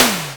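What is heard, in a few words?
snare drum, Simmons SDS8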